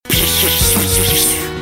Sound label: Silencer